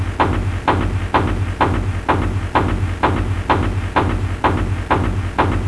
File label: pump; PSL Monthly Shareware CD-ROM (Public Software Library) (January 1994)